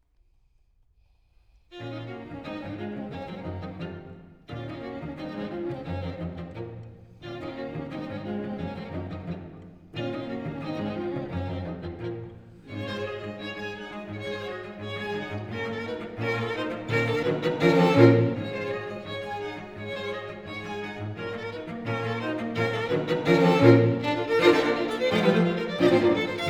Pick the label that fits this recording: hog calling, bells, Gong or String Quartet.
String Quartet